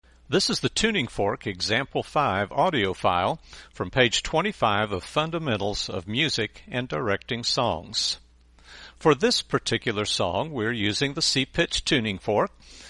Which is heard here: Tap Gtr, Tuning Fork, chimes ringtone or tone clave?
Tuning Fork